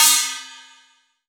Asian Gong; Cymbals

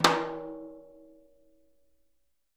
Living Drums, JZ XTOM 1

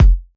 Kick, Kickdrum; Equipped Music; Loungin House

